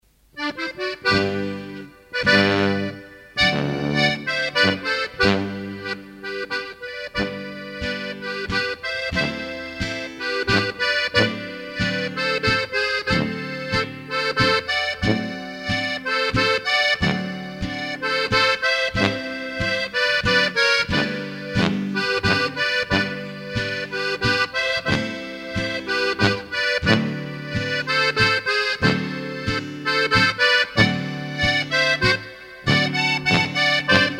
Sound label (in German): Plattler; Birkei